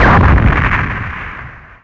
xplosion

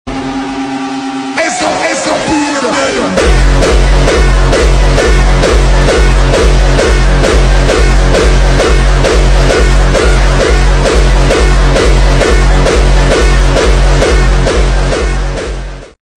dark triad

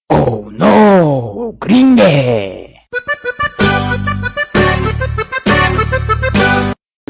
cringe

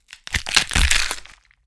bone shatter

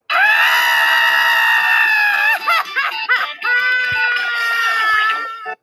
witch crying; pencilmation

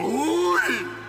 uuuuuuii

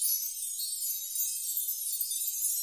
chimes

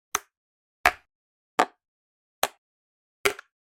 tapping on door

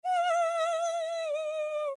whimpering

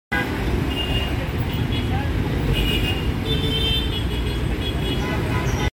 traffic

